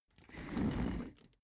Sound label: office chair trundle slow short